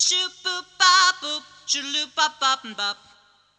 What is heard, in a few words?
Sing Voice Scat, Choir